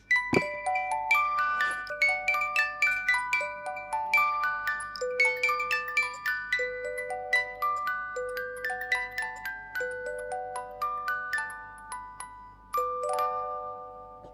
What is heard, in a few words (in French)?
18lames, boite a musique; Boîte à musique en bois personnalisable